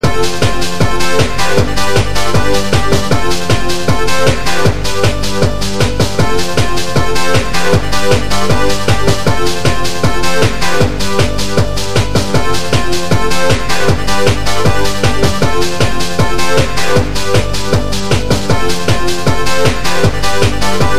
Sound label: 8bit; TwinkleCircuit